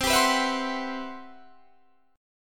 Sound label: C-Major Sharp 11th, Guitar DADGAD